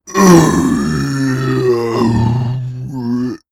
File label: Zombie Growl